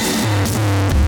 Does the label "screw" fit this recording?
Yes